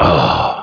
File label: Aah; 1997 MMX LAN PACK (win 95); Army Men